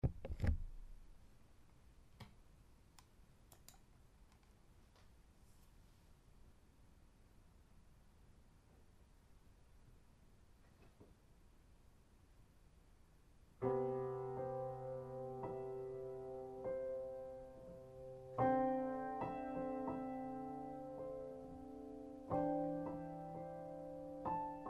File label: Piano